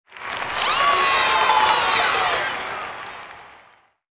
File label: applause; open toontown resources